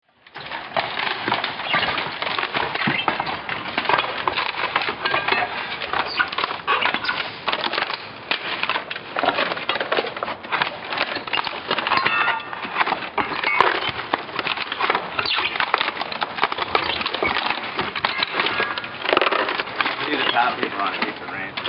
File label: rip n tear